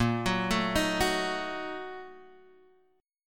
Bb Major 7th Suspended 4th Sharp 5th, Guitar, Drop D; 8,9,7,8,7,x; arpeggiated